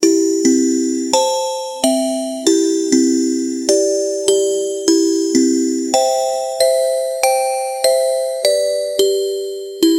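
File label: slot classic game reel spin, slot machine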